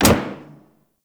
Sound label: Ship Hit; RailJack Hit Effects